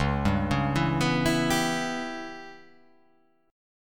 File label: C sharp Minor 7th Flat 5th C sharp